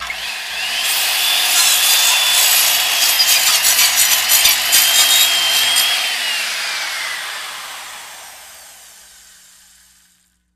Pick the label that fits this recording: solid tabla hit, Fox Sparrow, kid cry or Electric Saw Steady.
Electric Saw Steady